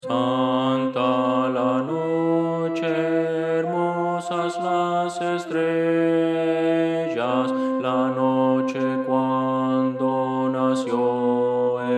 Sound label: contralto